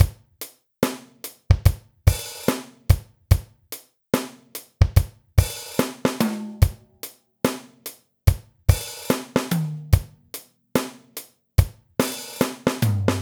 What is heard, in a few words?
Slow Toms No Crash